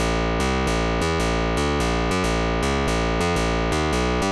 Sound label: fast fourier music; fft sonification